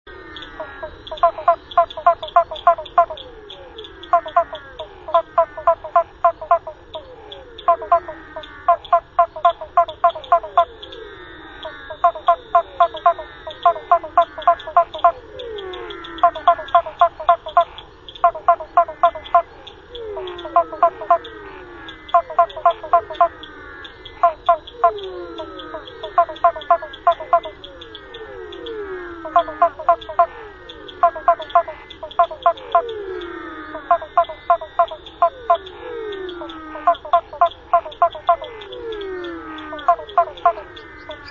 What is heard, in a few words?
Physalaemus nattereri